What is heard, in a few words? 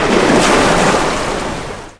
Big wave